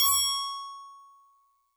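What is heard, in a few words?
Tubular Bells DX100 C sharp 0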